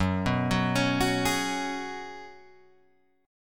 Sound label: F sharp Suspended 2nd Suspended 4th, Guitar, Open G; chordshape; down